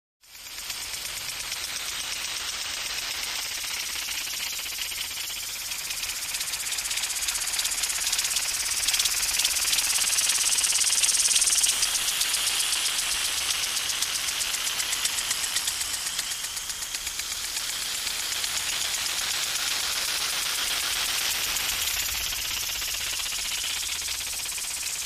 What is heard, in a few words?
Sprinkler, Automatic, Fast Rhythmic Spraying, C / U.